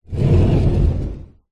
household cupboard door wooden slide low frequency; zapsplat